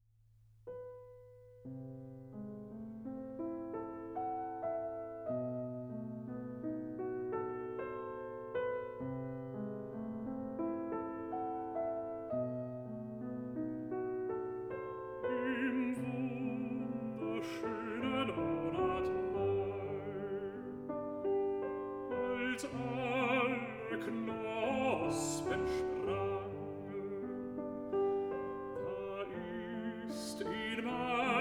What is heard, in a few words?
song cycle for voice & piano; Dichterliebe; Schumann